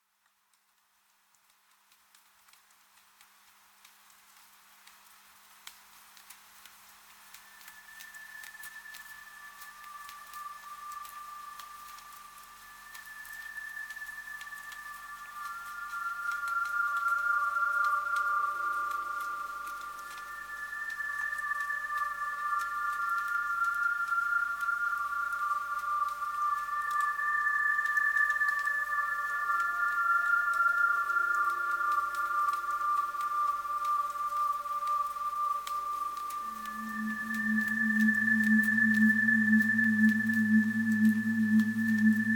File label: Abandoned World - Dark Ambient Soundscape; Mixes and Soundscapes